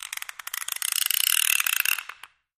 Thumb Screw Turns, gears

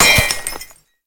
Glass Impacts Debris; windows & mirrors

